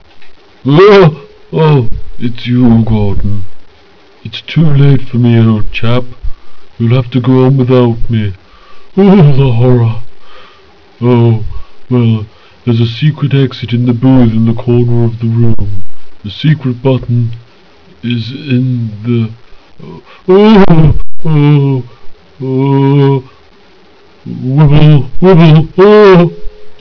wibble

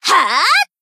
jump

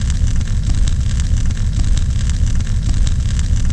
fireplace burn

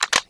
fusion reload, weapons

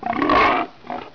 pain, bullchicken

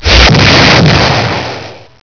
kabam

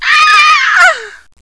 scream

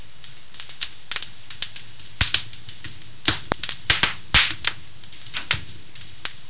amb torch

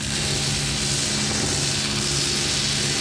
electrical

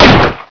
boom; hgrunt2

